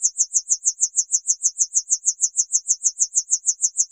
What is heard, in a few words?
Chirp FX DX100 C5; birds